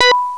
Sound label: signal; Outrun; Arcade